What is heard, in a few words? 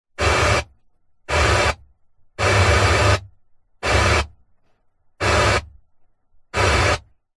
car radio static bursts pressing through presets internal nissan patrol; vehicles